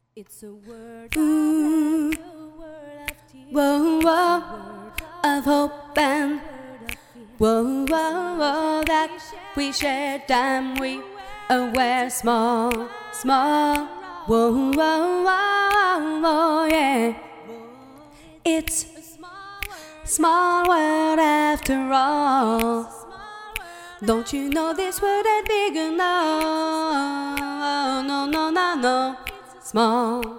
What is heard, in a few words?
acapella